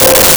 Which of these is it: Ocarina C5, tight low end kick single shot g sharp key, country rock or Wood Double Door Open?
Ocarina C5